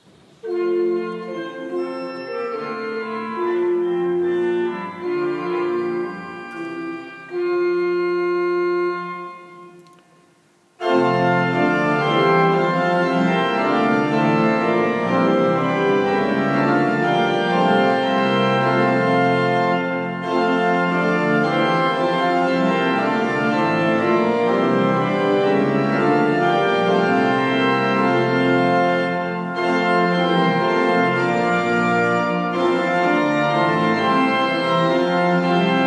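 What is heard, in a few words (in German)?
Zeilhuber, pfeifenorgeln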